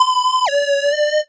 Beat

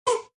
Pain hit; iStunt 2

